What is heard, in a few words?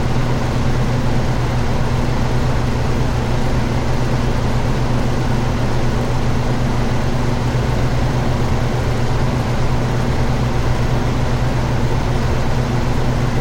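ambient air conditioner condenser loop, electric; Half Life Model Pack; Half Life Alyx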